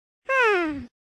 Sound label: Sigh; Pikmin Nintendo logo